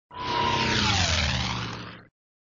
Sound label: Sound Plane flies by